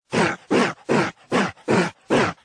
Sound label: Emote Happy Baby Dragon